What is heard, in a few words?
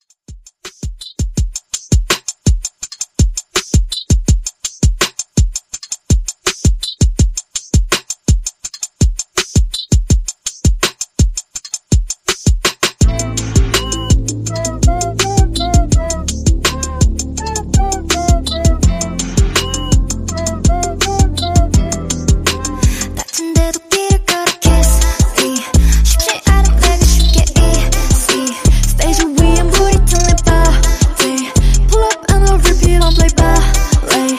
Mixshow Intro